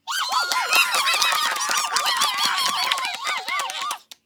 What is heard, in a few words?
cartoon monkey applause